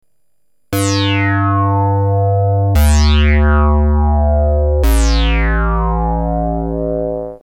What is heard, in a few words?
phatt pulse